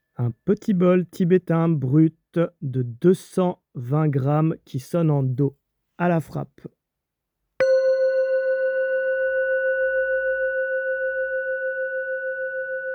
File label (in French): Petit Bol Tibetain Martele 7 Metaux Aspect Brut Note Do